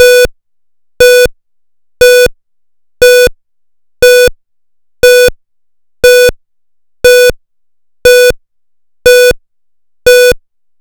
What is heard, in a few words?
Interrupted Germany, Germany KTA3901 (evacuation alarm); Interrupted tone; pfannenberg; signaling-technology; combined-signaling